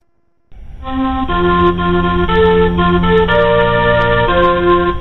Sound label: Germany DB Jingle